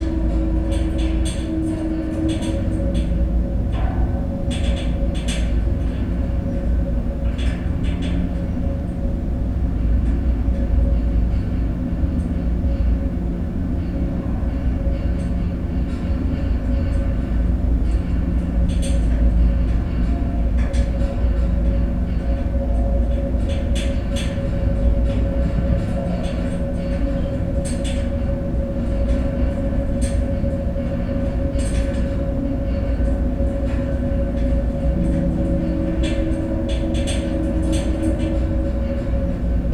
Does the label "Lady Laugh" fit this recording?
No